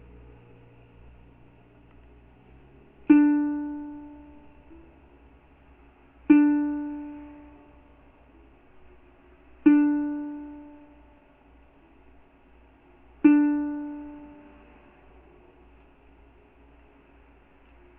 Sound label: D tune, ukulele